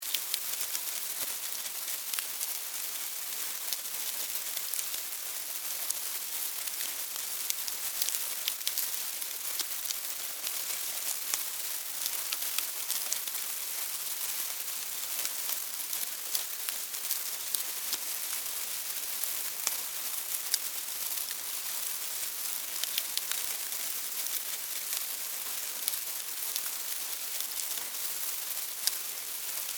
Termites, Animal, Termites, Infestation, Bite, Wood, Busy, Colony, Activity, Insects, Bugs; Ant Sound Effects; BlastWave